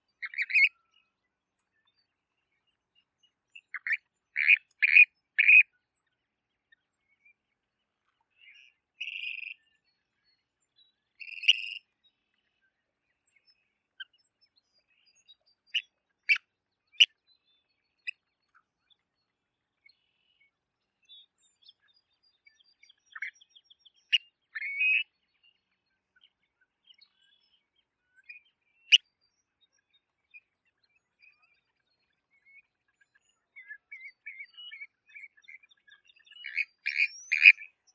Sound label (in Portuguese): Canto do mergulhão de cara branca